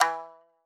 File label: marimba shamisen; ゆめ2っき